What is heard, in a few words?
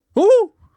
Unmask Startle; Mask Maker